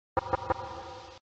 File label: knock; mgs